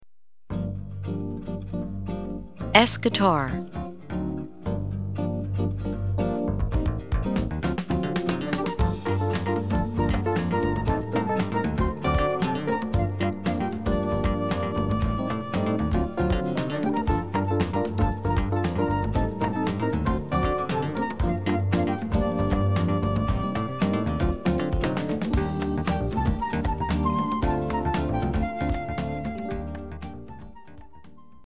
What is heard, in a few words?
s guitar